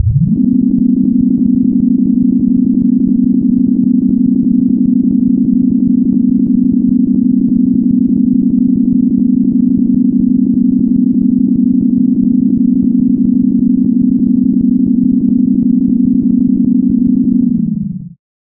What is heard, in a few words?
elevator last; Undertale